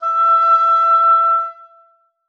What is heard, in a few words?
oboe e5